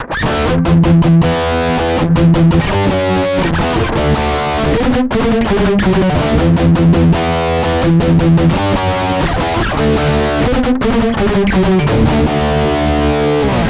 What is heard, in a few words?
Palm Muting; sansamp